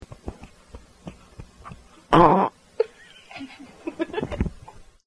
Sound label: burp not gas